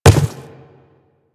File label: Quadruped Medium Walk Dirt, Footsteps